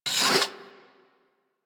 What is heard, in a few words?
Leg Movement; Enemies; Warframe